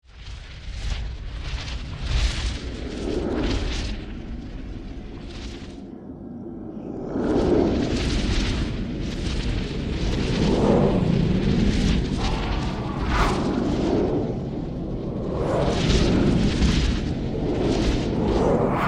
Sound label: Magical Spell Fire, Horror, Magical, Spell, Fire, Whooshes; Magic (Sound Effects:Science Fiction); BlastWave